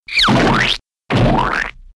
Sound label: Pie Splat